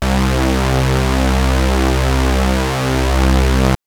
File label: Synth SawPad c1; TrashLibrary